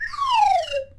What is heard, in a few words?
Flutter Drop, Slide Whistle